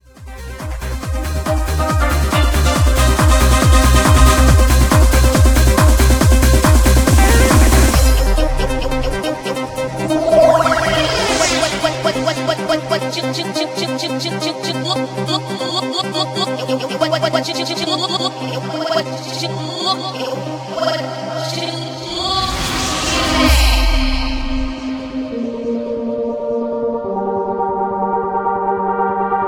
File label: Trance